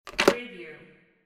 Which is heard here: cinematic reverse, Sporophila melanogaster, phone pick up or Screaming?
phone pick up